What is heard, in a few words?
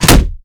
riot shield impact; weapons; melee; Modern Warfare